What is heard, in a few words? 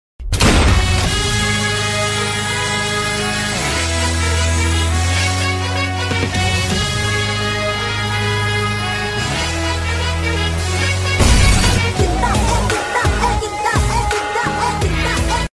Hindi Ringtone